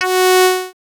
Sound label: Galactica, F#4, Synths Multisamples; Ableton Live 12 Lite; Core Library